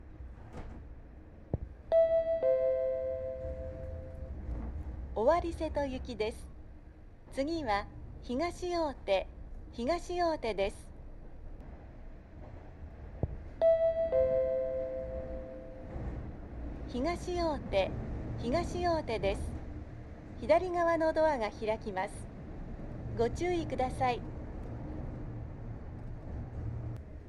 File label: train